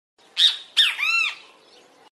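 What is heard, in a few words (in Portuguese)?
bem-te-vi ringtone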